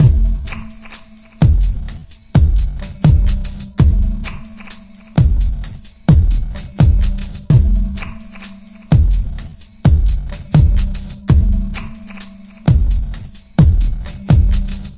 64 stretchy tripletted tone, drum loops and breaks; 000 to 080 bpm